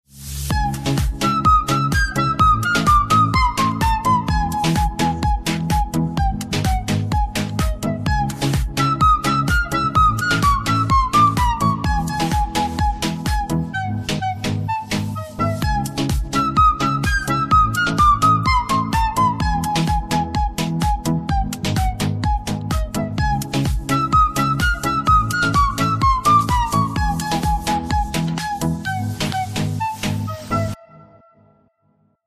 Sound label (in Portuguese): Flauta